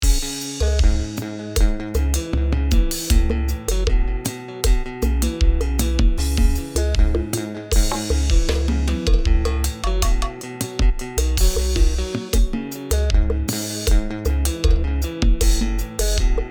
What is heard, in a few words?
Guitar; Silex